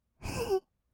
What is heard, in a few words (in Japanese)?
"ふふっ", kawaii voice; 1200 kawaii voice!!; 色々なワンショット